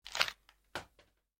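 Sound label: paper ball thrown into the trash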